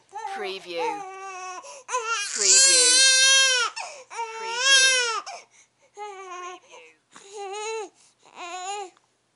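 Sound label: Baby 1 Month Cries; Baby sound effects for use in multimedia projects.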